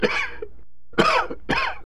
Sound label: Cough